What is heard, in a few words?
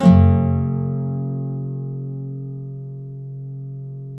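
nylon up major G; Spectrasonics; Hans Zimmer Guitars VOL-2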